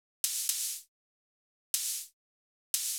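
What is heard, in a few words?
Hat Op Dirty, open hat; hydrogen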